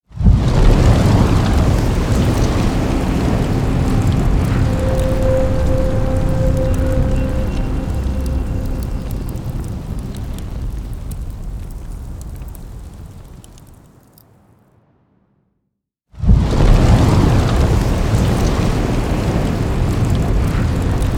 fire logo reveal

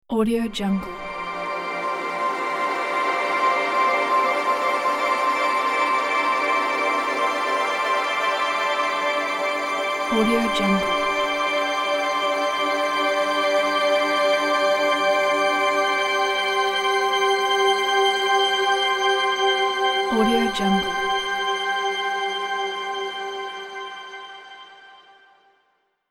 transition starlight

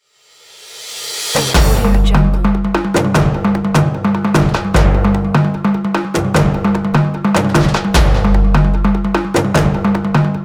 percussion

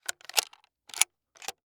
gun foley rifle; prosoundfx

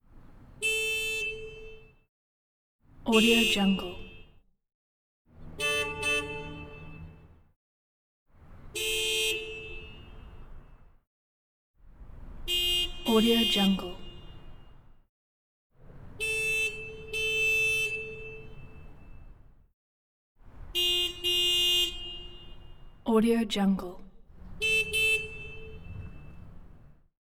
european horns continental harmonic

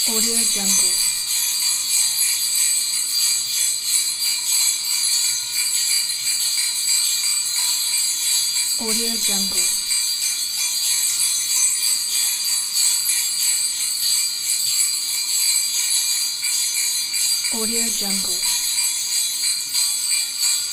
sleigh bells loop

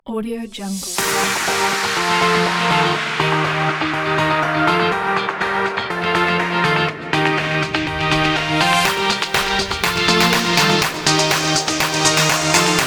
dance energy

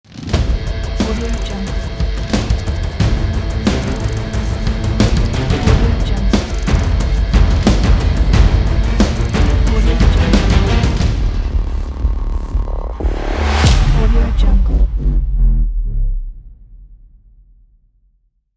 madman strike ident

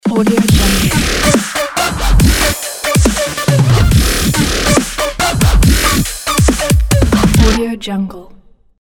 dubstep logo

